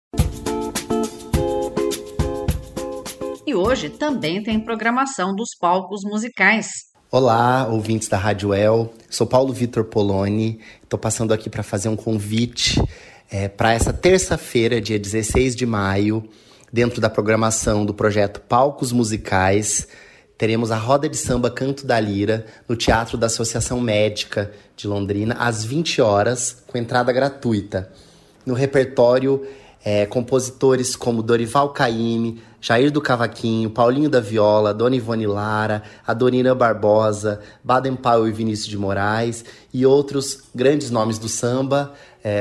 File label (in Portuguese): RODA de SAMBA